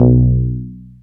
BAS FM DX-7

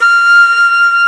FLUTE, WIND; AKAI S-Series CD-ROM Sound Library VOL-2

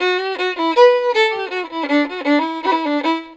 VIOLIN, Celtic; USB Soundscan vol.42; Celtic Flavours; AKAI

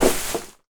Rubbish Bag, household rhythm samples hits

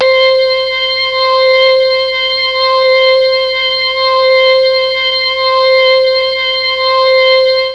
C4 ORGAN, Mellotron; Mike Pinder - Mellotron Archive CD

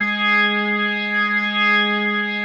G#3S, B3, Vintage Keyboards

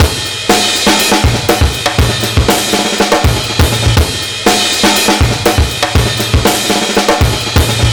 121 bpm; PRESTIGIOUS; 90 s Sample CDs; Planet of the Breaks

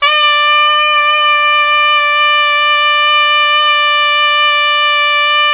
BI SUA D5, BISUA REED; Spectrasonics; Heart of Asia

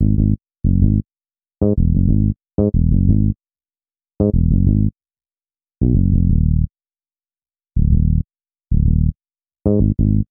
Bass, Hip-Hop

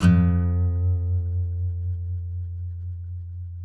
F, guitars; Spectrasonics; Hans Zimmer Guitars VOL-2; FNGSTYLE PFM

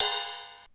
DMX ride; 90 sSampleCDs; Crypton - DrumBase5000

